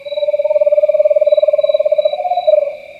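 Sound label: eastern screech owl call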